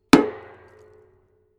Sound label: metal impact tank small solid